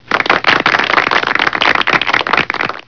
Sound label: applause